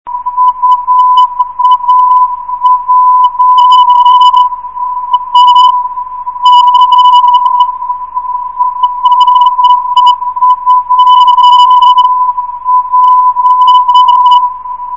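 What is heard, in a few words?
uap 435khz, non-directional beacon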